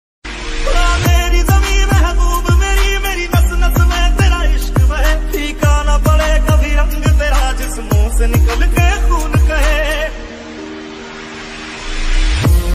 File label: army ringtones hard ringtone bgm; india army